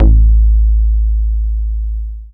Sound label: bass attack, techno trance; Ueberschall; Techno Trance Essentials